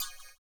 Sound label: CYM, Ethno Techno; ILIO - Ethno Techno